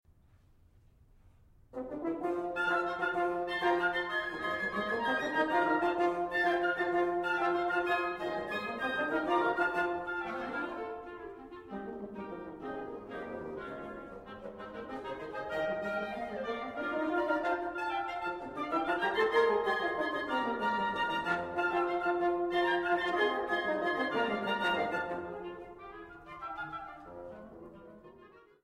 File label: Faculty Wind Quintet